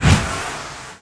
Tentacle Sword

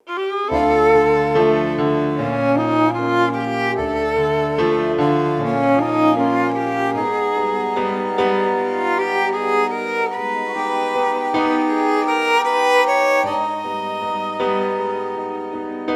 Loop; The Act; Arcade, iOS, MacOS, Mobile

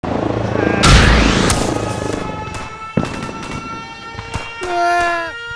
Punch

